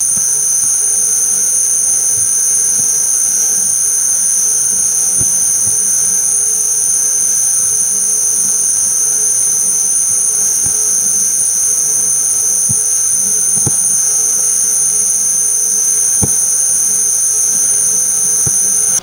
continuous high pitched synthetic laser beam; lasers

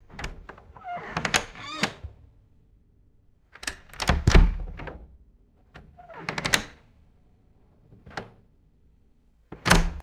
interior wooden door opening and shutting; doors

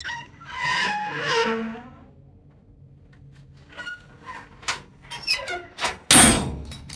harsh metallic squeaks and groans

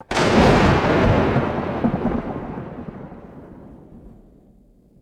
loud explosive thunderclap and deep rumble

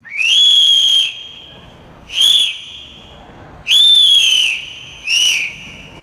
short scattered whistle blows outdoors; whistles

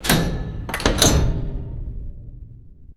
heavy metallic circuit breaker switch; mechanical

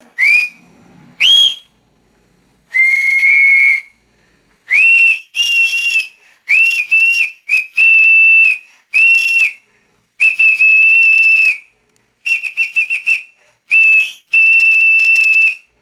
high pitched police whistle toots

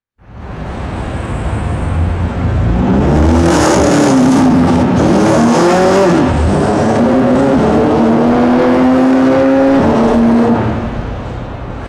distant sports car double acceleration; vehicles

